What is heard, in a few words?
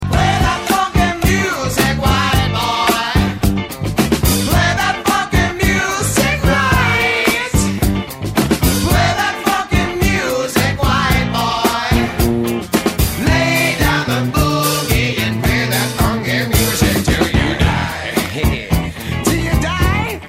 funky music short